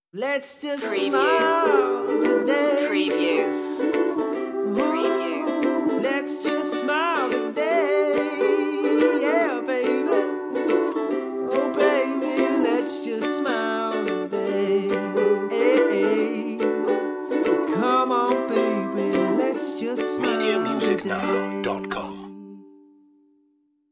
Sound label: vocal version